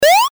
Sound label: jump